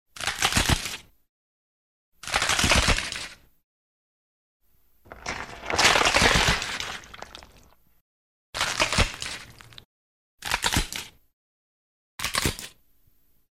6 variantov hrustov kostej

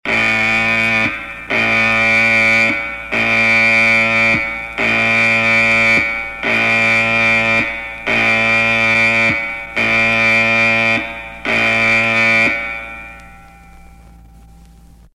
zvuk trevogi; Звуки подводной лодки

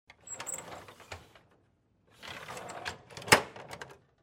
karetka kseroksa s bumagoj

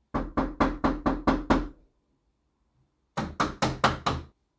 po zamochnoj skvazhine i po glazku

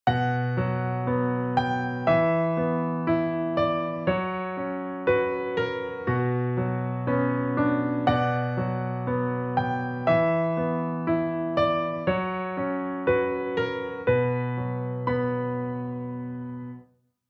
fortepiano

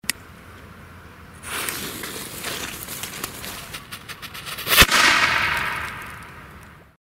petarda s podzhigom; Звук петард

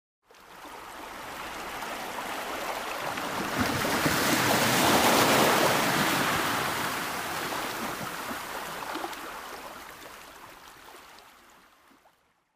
medlennaja shirokaja volna

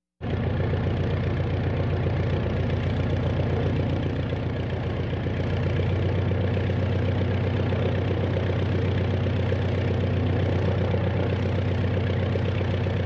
Kukuruznik rovny hod dvigatelia vnutri

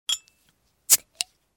zvuk akciznoj marki

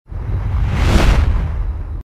pronessja ogon; Звук огня; Звук огня для монтажа перехода

